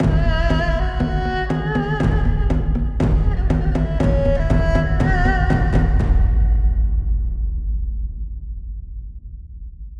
Bonus Loop Outro; Wu Xing; genesis

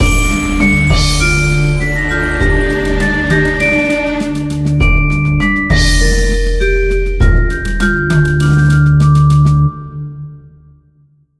Win; Mirror Magic

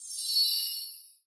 anticipation start; Recode; rampage riches

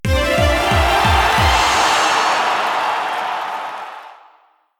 Win; genesis; euro Golden Cup Desktop

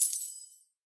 win low; Rabcat; north Storm

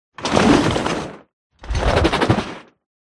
bonus giga idle; Recode; rampage riches

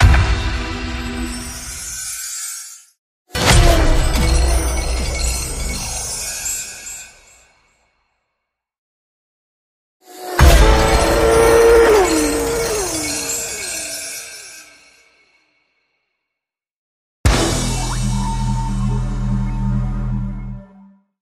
Big Win; Mahi Gaming; Heat Is On

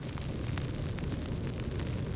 burning loop; Skillzgaming; battle Mania Desktop

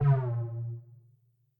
game over; Skillzgaming; gems odyssey 92

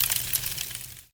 Fight Sequence Fire; Wu Xing; genesis

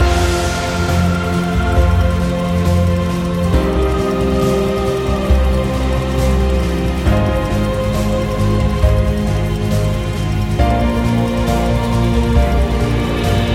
win bigwin loop; hades River Of Souls; Fantasma

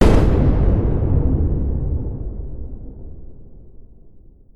Scatter Win; Wu Xing; genesis

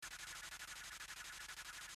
reel loop; Online Slot; Rabcat; robin Of Sherwood

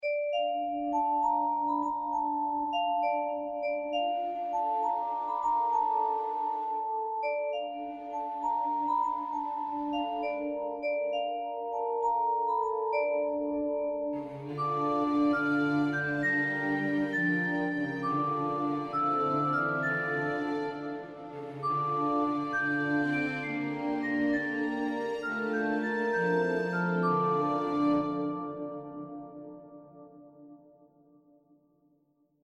Background; Mirror Magic

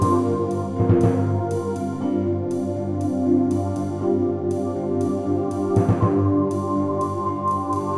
Spin Music Stereo; loki Wild Tiles Desktop; Ingenuity Gaming

